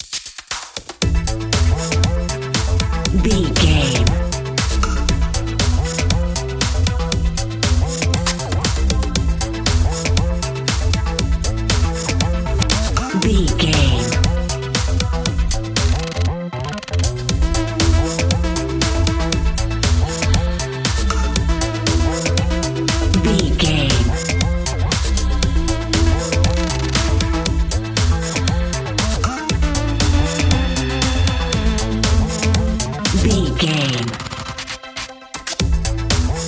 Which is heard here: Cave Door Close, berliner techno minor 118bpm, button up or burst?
berliner techno minor 118bpm